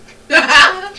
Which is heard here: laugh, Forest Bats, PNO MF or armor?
laugh